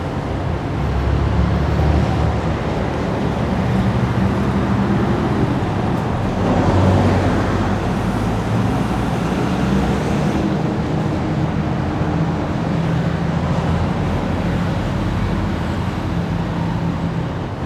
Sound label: STREET, TRAFFIC; AKAI S-Series CD-ROM Sound Library VOL-6